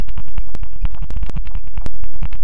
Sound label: clicking noise grunk; LOOPING AMBIENCE